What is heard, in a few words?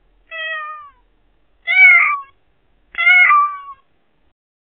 cat overthinking, anxious